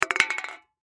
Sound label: Can Drop Aluminum Empty; Soda Pop; Foley; Blastwave